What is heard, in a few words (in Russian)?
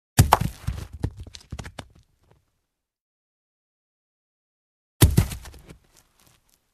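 камень кидают в грязь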